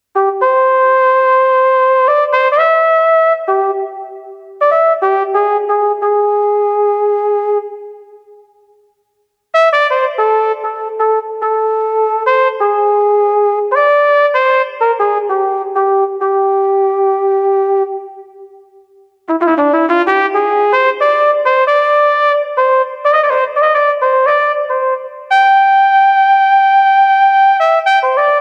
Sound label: trumpet